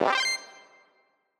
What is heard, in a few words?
Message Sent